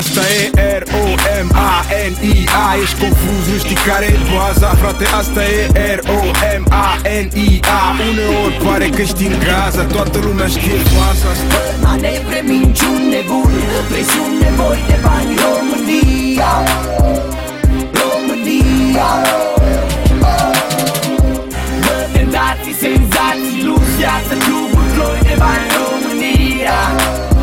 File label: romanian hip hop